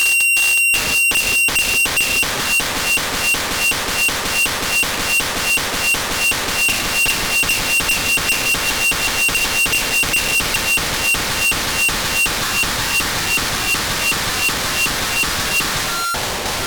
SNORING DOG; lolicore

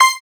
Noises Strings; Synthetic's Serum Collection; Production Master - Vital Future Bass 4

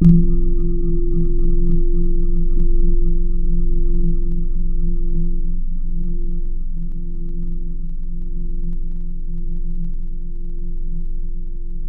Old Organ; Synthetic's Serum Collection; Surge Sounds - ASTRO - Serum Wavetables

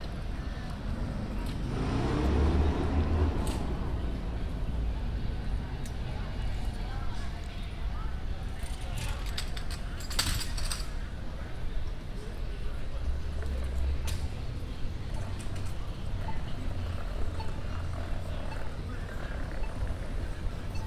San Francisco Soundscape With A Crossing Beeper; San Francisco 6.22.2017

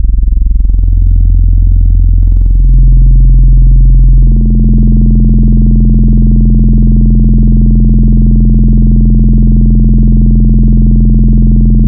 Pitch Jumper; Synthetic's Serum Collection; Echo Sound Works - Modular

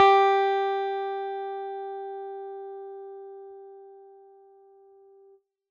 Elect Pick G3, Noises; Synthetic's Serum Collection

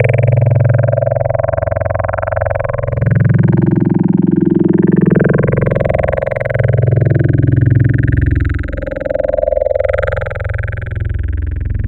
Vocal Digital Vowels; Wavetables; Synthetic's Serum Collection; ESW Core Wavetables; Echo Sound Works Core Tables

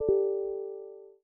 Windows Message Nudge; Windows 11

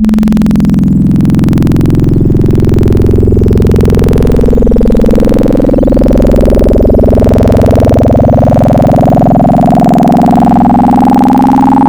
Fm Oozy, Serum Wavetables; Synthetic's Serum Collection; Cymatics - Nuclear for Xfer Serum Wavetables

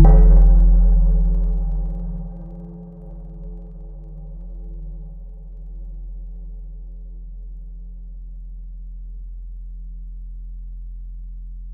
Grey Marimba; Keys; Synthetic's Serum Collection; ESW X Keys

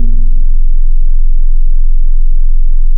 Real Vibraphone; wavetables; Instruments; Synthetic's Serum Collection; ESW Core Wavetables; Echo Sound Works Core Tables

